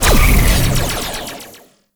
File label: beam start; Duviri